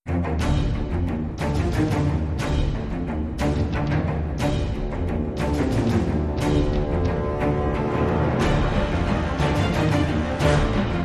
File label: No Choir